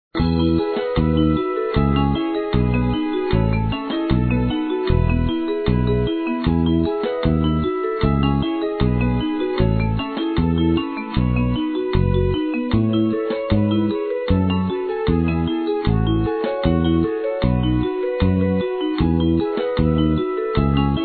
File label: tropical theme; butterfly island adventure